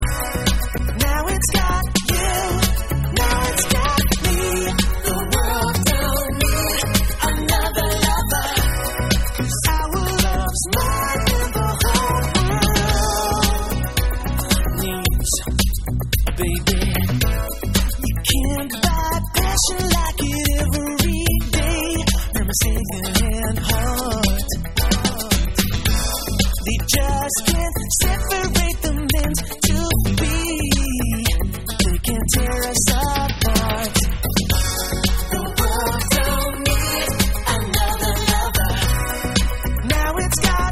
New Bass

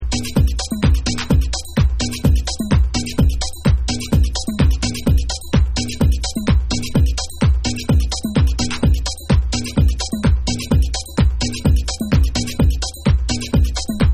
SWING

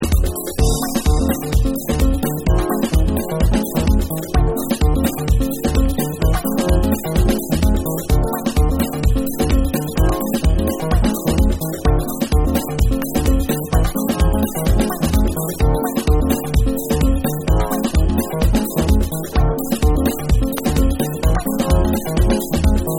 Dub

